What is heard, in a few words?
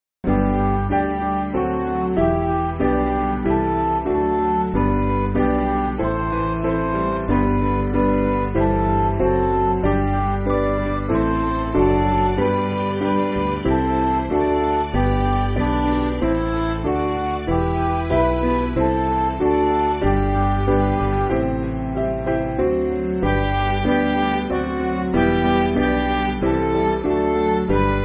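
Midi, Music Backing Files for Churches; Glory To Thee; Tallis Canon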